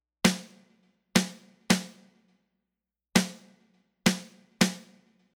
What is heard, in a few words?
sn bypass